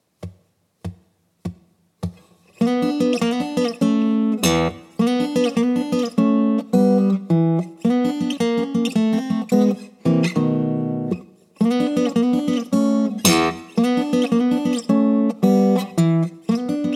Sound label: Blues Guitar Turnaround